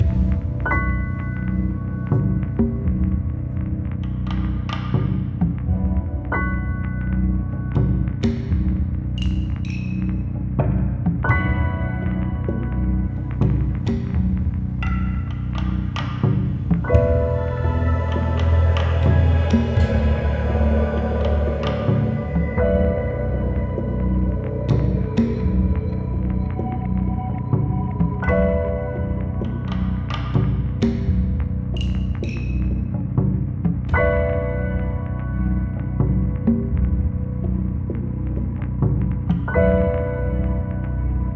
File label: drums synth; Hitman Go Definitive Edition; PS Vita